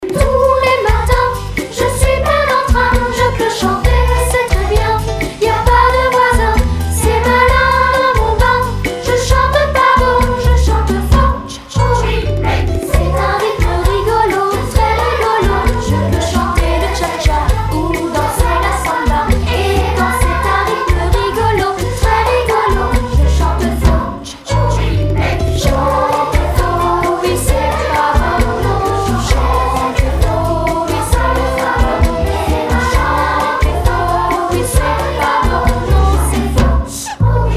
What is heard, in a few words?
Cha-cha